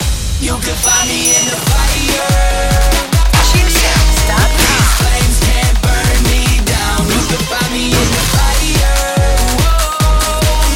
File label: fire theme; breathing fire